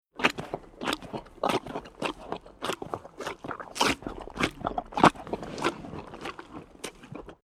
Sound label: Animal Horse Eating Nosily Chewing Mouth Noise; Nature; Horses; Tom Hutchings